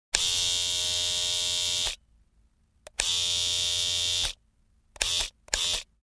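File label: trimmer switching on switching off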